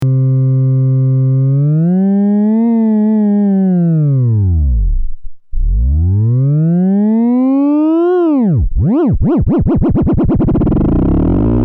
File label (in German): Exponentielle FM